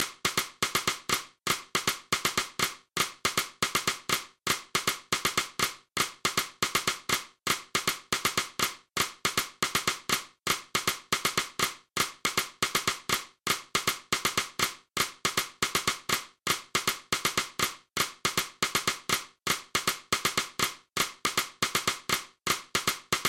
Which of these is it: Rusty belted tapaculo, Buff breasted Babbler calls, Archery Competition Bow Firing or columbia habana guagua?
columbia habana guagua